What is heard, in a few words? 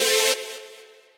saw